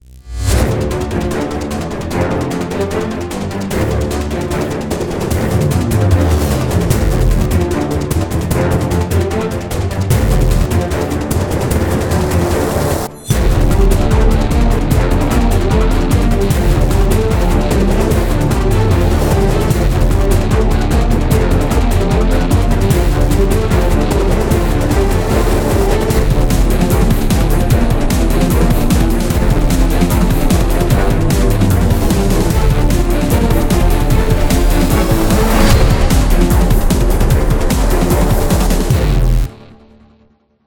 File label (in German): Elektronik; Spacebourne